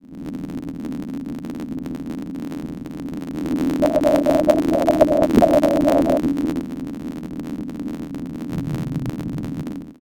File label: footing near the boat